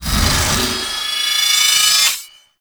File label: Heavy Hammer Attack Charge; Warframe; Duviri; Dax; Weapons